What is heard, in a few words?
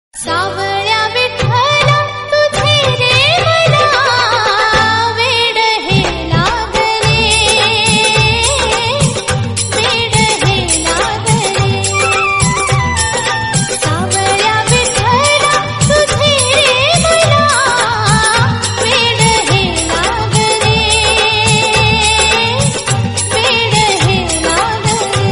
bhakti ringtone Marathi ringtone; vitthal ringtone; devachi ringtone